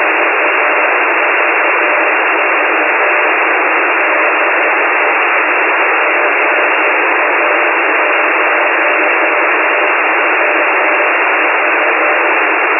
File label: cw 3kHz